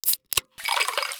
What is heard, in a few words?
soda fill; M8; percussion and foley